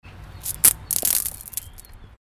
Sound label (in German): Kieswerfen